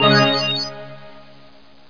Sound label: wand; CD Action 12 A